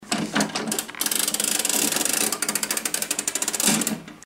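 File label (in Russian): прокручивается ручка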